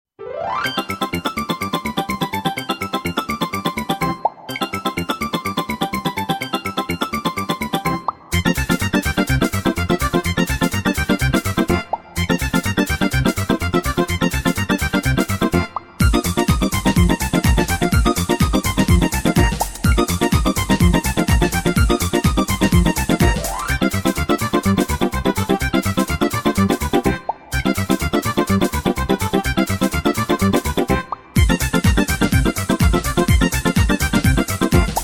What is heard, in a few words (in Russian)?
Весёлая музыка